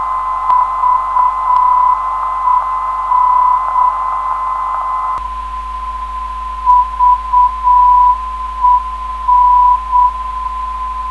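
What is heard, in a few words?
VEN 379kHz 400 24Hz, non-directional beacon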